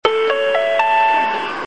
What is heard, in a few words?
bus chime; 遠州鉄道; チャイム３; 一般路線バスはチャイムなしですが、“次は終点”の放送のみチャイム２が鳴ります。